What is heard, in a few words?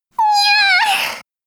hnyaaaaa